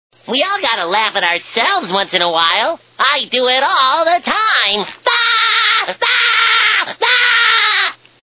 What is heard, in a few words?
laugh; spongebob